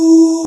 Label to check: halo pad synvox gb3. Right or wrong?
Right